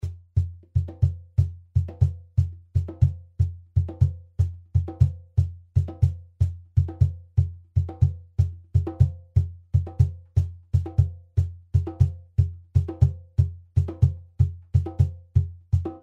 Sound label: PERCUSSIONS